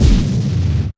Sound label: EXPLOS